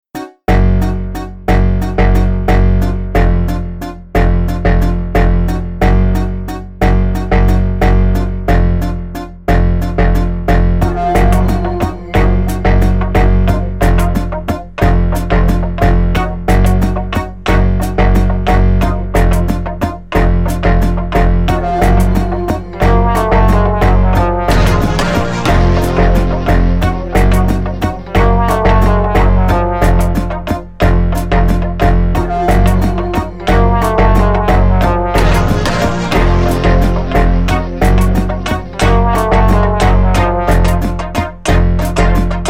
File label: composite keys